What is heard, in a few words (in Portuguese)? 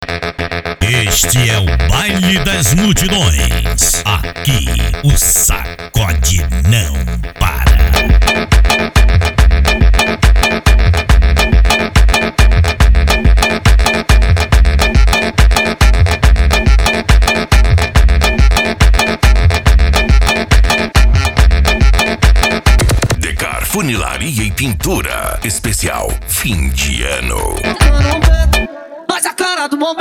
funk ritmado